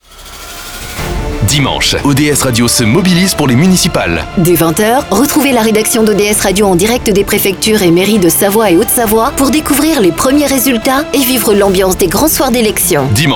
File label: jingle; auto promo